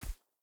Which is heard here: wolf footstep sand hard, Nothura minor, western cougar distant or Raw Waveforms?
wolf footstep sand hard